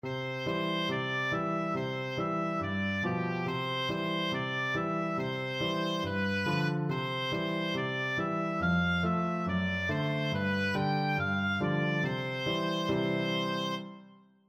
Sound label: yankee doodle, oboe